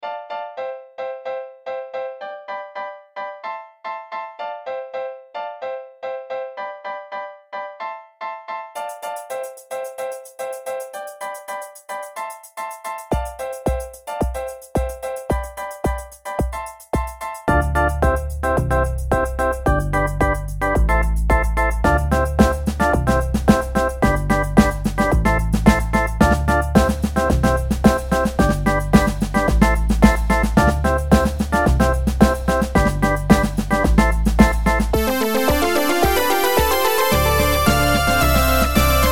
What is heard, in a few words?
Old School Club Track 110 bpm